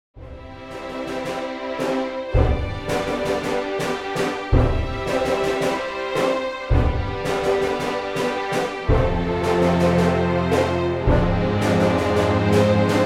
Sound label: movie music